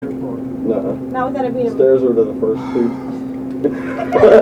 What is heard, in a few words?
Loud Sigh